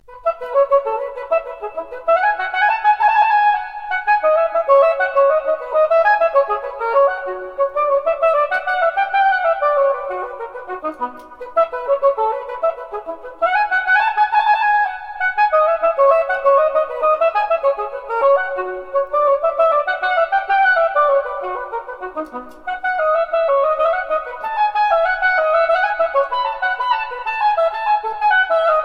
Suite in F major for unaccompanied oboe after BWV 1007 gigue; Johann Sebstian Bach; JS Bach - Transcriptions for Oboe